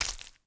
hanging Lizards Bump; impacts explos

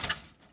hand grab chain fence

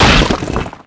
bouncy tombstone hit; bouncy objects; Tony Hawk's Project 8; XBOX

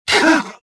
pushed, rodian

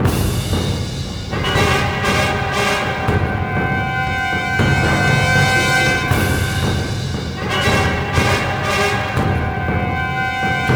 music ice boss; The Legend Of Spyro A New Beginning; XBOX

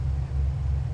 exterior; ambience; Soldier Of Fortune II Double Helix; XBOX; kamchatka

